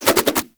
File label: nunchuck spin whoosh; Warframe; Infested; weapons